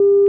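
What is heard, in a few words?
Cockpits Morse NDB Morse Dash; DCS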